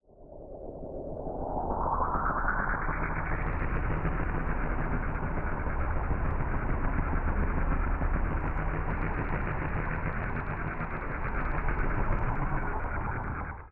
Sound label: SPACE SHIPS; SpaceSounds